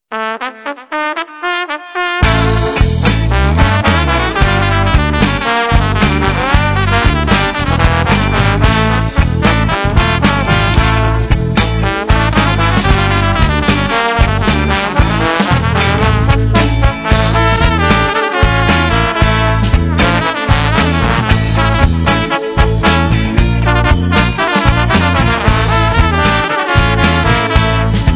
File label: polka